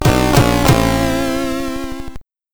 Game Over, SID Stereo, commodore 64; Steg the Slug

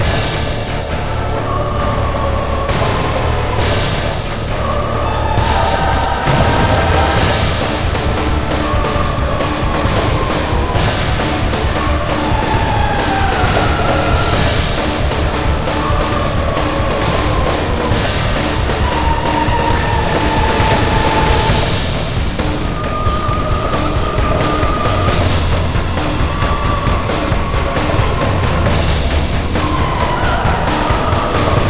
Battle Loop

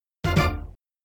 Bonus Add; Indiana Jones The Pinball Adventure; Williams; arcade

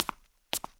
Steps Concrete

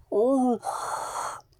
antic

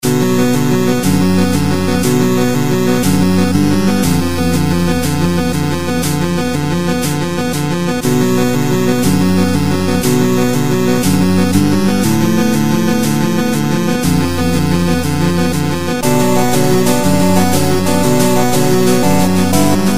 music dark castle; Dash Quest; android

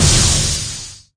Pickups; unreal development kit

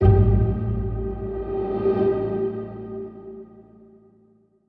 Restaurant Modular Strings; The Detail ios linux macos windows gamerip 2014

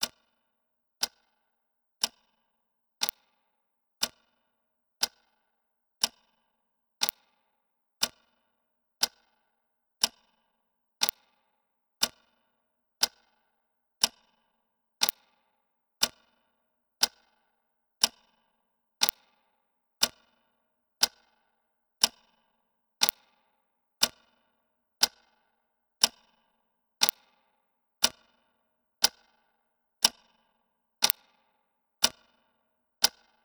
Clock; the masquerade lullaby

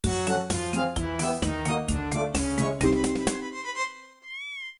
loss; Rat Pits

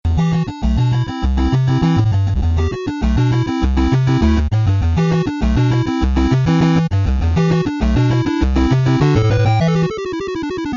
Panel Music; Electrocop

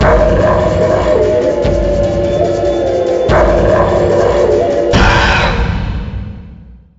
Taiko Bgm; ps vita gamerip 2011; Army Corps of Hell

